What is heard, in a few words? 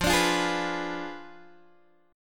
F Diminished 7th F down Guitar Open D